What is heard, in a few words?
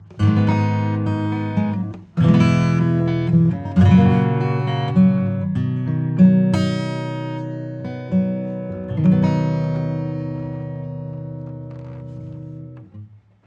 Dirty Tape acoustic